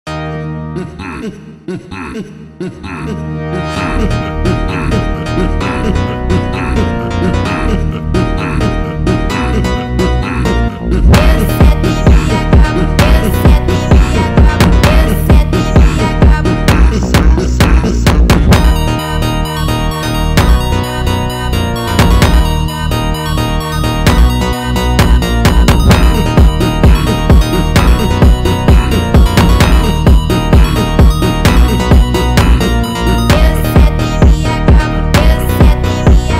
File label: Brazilian Phonk